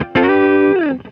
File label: TAR GEL, Roots Disco; USB Soundscan vol.32; AKAI